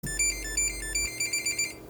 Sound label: startup tone; PX4 Autopilot